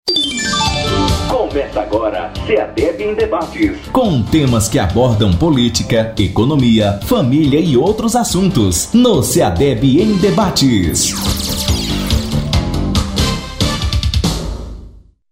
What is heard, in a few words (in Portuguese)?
Vinheta